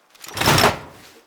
open; hellblazer

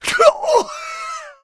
death

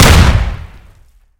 hit; hellblazer

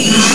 Razor Hum

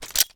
mag pin, gun; explodiumgun

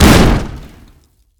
loot expl

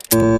tether fail

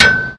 hull hit

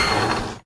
B Saw End

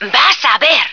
die, female

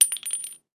case, explodium gun

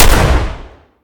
Quad Fire

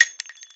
casing; silverbullet

